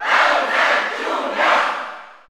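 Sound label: Cheer German, crowd; Bowser Jr.; SSBU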